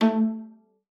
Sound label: A3, VIOLA FINGER; Xsample VOL-5